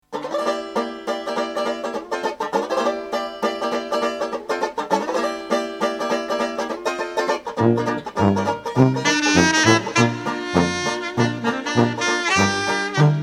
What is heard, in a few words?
Medley marching band